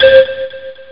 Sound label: flute